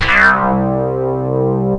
power bass; jazz jackrabbit 2; M8

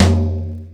Toms, Drum Machines E-MU Pro-Cussion

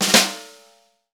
SNR, Snare; Club-50 - Foundations Roland; Ext. Snare 4

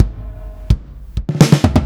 Fills 128BPM, Clubsounds

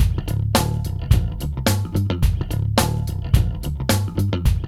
LOOP

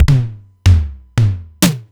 Simmons SDS1000

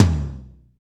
TOM TOM, Roland

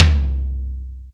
tom, Yamaha TG-500

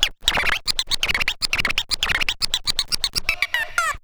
SCRAT; Best Service; Advanced Mega Effects

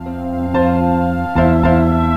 Piano, Trip-Hop Loops; Sonic Foundry (Sony Creative Software) - Rondo Brothers Trip-Hop Loops & Samples; Apologists

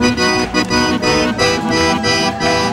ACC BRK, ACC BREAKS; Best Service; World Colours

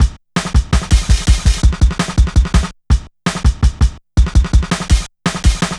Shuffling Live, Jungle Drum Loops

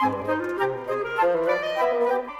Winds, Orchestral

